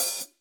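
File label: hi-hat, Drums; Bob Clearmountain Drums I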